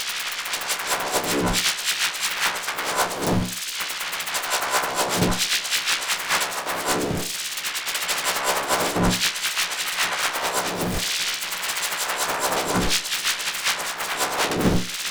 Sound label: seized fractured texture helicopter